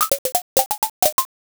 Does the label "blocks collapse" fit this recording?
Yes